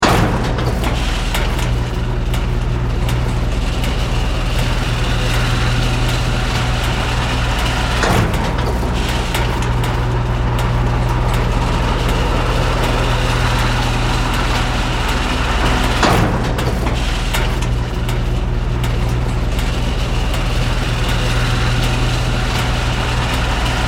Steampunk, Device, Conveyor, System, Science Fiction, Loop, 5.1; BlastWave